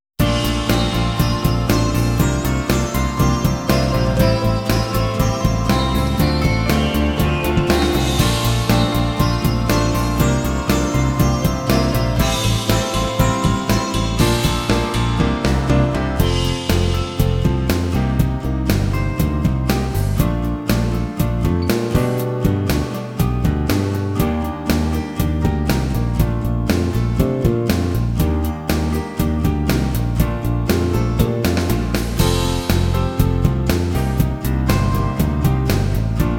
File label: Karaoke; Memories Off 2nd Complete Box Time Capsule of Memories